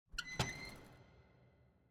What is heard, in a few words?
tripalium chain; ambience room torture